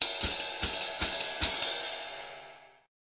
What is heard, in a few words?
148 jazzy rides; 141 to 160 bpm